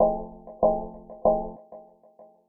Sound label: Banjo Plucks